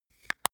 makeup box close; haus-garten; badezimmer

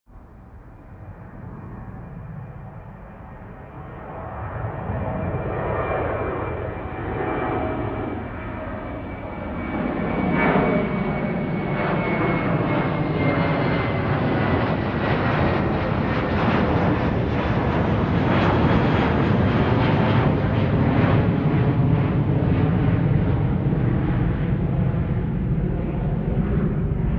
plane flyby land tonal

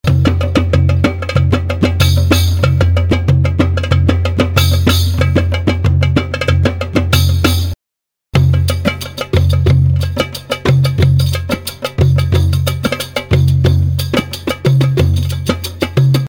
oriental percussion; music elements; grooves

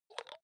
hygiene small cup open medium; haus-garten; badezimmer

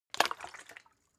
bottle water upend, gastronomie; berufe

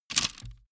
bamboo small drop; objekte; holz

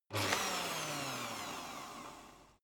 electric whisk rev; haus-garten; kueche

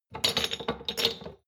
shot glasses clatter soft, gastronomie; berufe; soundpool

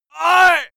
male hit; menschen; schreie

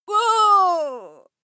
female fight moan pain; schreie; menschen

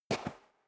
step sneaker sand single right

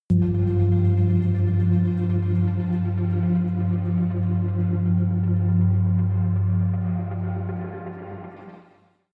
synth drone hit rhythmic high

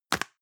plastic can lid screw close hard; objekte; dose

